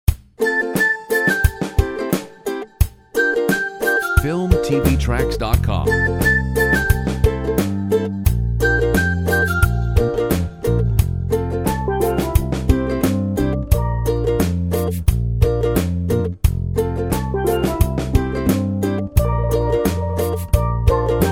Ukulele

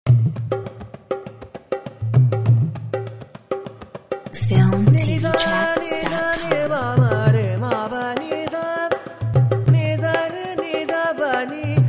Rajasthan dance

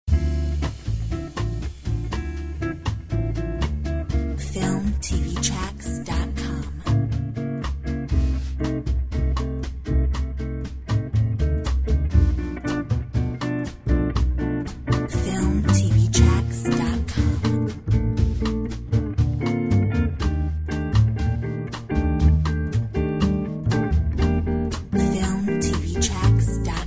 Mellow Bossa

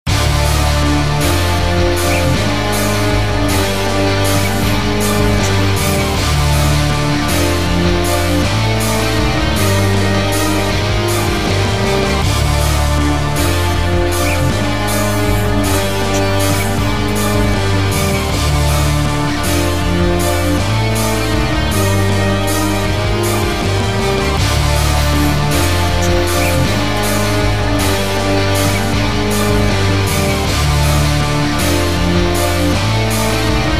Extreme Danger

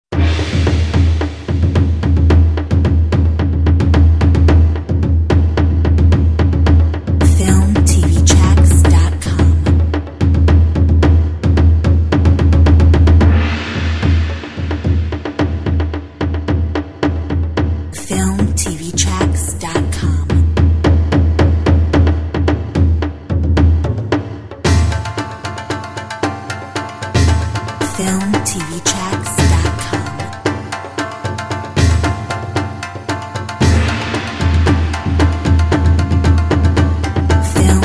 Asian drums

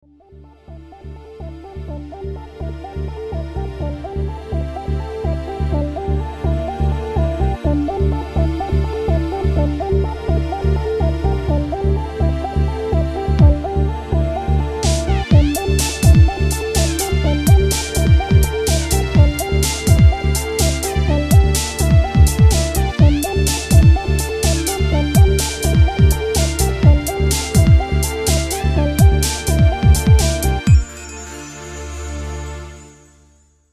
High tech

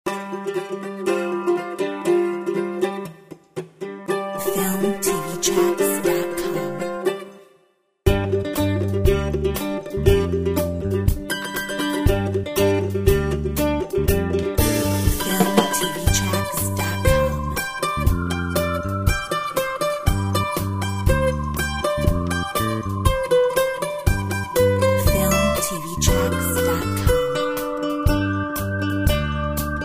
Folk Mandolin; Filmtv-tracks

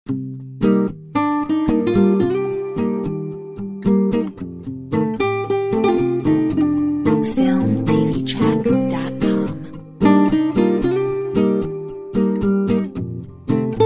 Flamenco Romantico

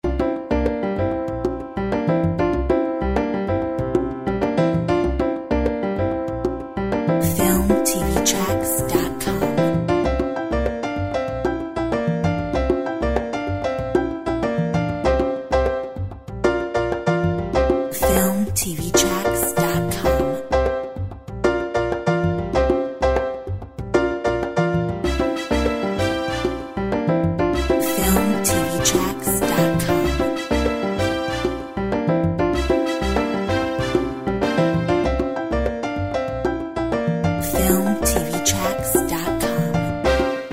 Pianistic salsa

